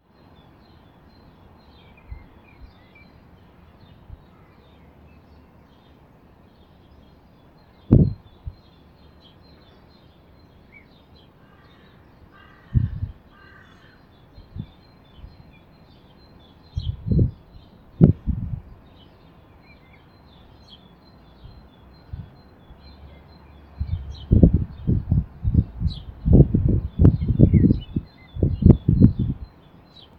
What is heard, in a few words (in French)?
chant des oiseaux; paris; confinement